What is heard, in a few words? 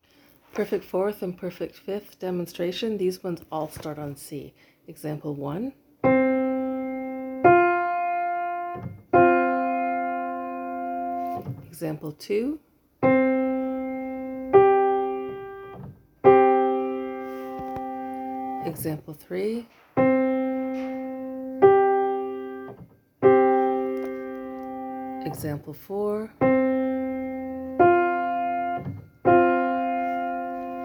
P4 and P5 beginning on C; Interval Identification